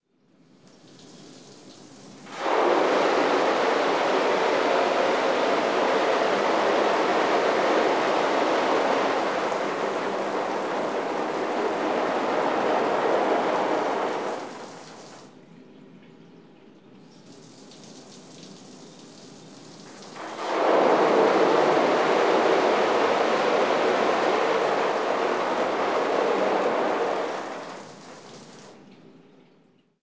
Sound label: karcher oc3 pressure washer on silk screen